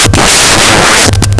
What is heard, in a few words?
attack; sven; aomdc; monsters; screamer